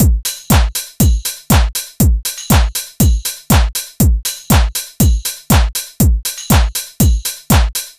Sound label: 120 trance beat, Drum & Perc Loops; Trance Explosion Vol1